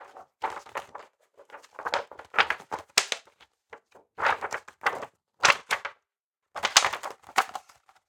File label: Plastic Face Shield Movement, Mask Foley; InMotionAudio